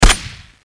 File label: rifle Single, guns; TDGame